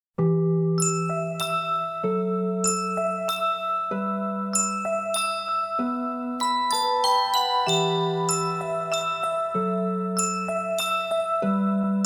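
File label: lullaby raindrops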